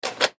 Hospital Room Interior, Hospital Room Interior Door Unlock; Emergency